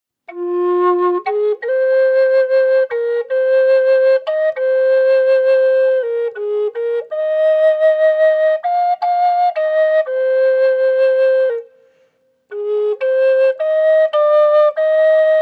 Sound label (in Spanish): tono F, flauta medicina roble; varias tonalidades